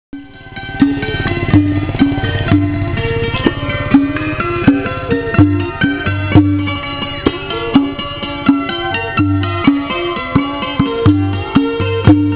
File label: temple music; Land Mine Music in Cambodia; thai cambodia